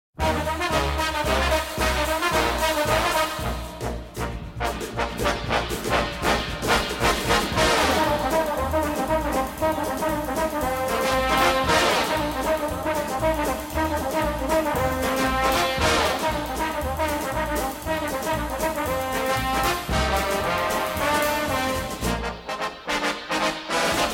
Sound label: trombones, ringtone; Tuttis; Jazz